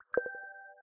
notification moon drop ringtone

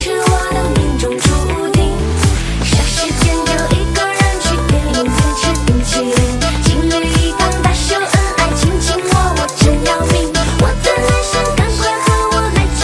chinese pop music

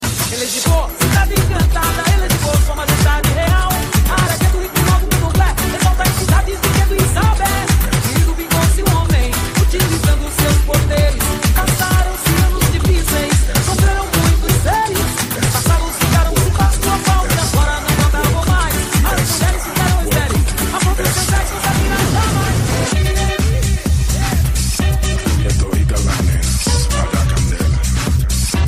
latin dance, ringtone

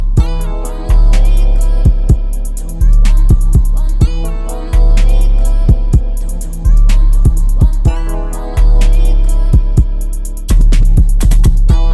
wake up ringtone

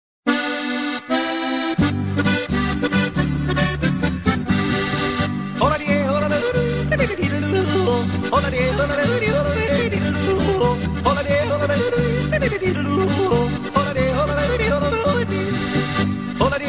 from austria

